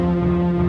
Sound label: music